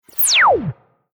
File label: Zap Down Sweep Scifi Digital Computer Interface; Sound Design; Warped